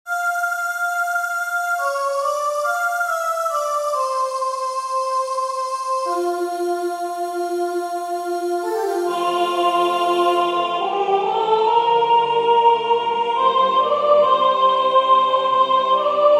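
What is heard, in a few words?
Soprano; W.A.Mozart; Laudate dominum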